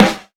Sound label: snare